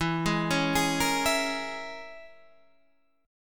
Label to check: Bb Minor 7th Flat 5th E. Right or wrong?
Right